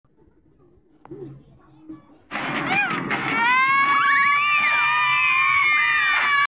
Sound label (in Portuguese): gritando